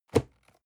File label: cardboard box medium place soft; objekte